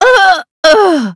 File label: death; deadlock